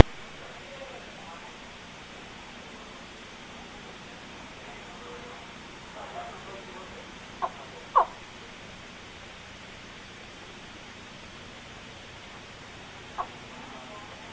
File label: chirp